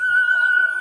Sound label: whistler, synths; Notes